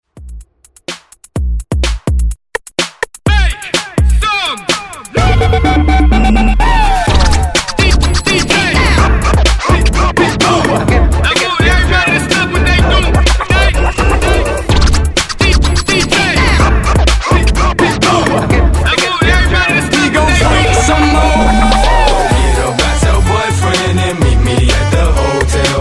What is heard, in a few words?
Scratch Re Drum 126 Bpm; DJDOLLS